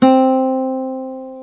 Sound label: GTR nylon; Club-50 - Foundations Roland; Nylon Martin